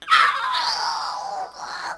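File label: spider die; DAoC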